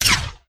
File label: Turret Attack; FPS; Enemies; FirstMicroGame